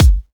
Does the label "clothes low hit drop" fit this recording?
No